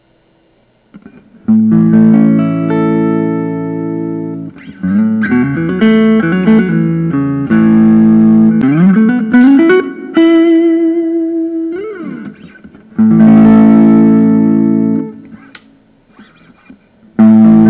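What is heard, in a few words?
paul reed smith mccarty 594 hollowbody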